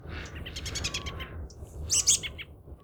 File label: robin Laugh; birds